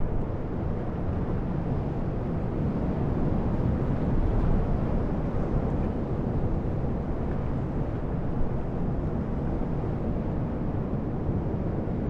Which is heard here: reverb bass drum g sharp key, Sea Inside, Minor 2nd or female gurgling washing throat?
Sea Inside